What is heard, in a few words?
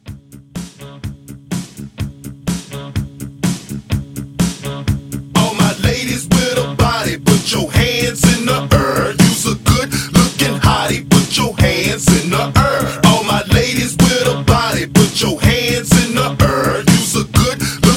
80s Hype Edit 125